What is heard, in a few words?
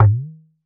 Talking Drum Bend, Percussion; Roland BK-5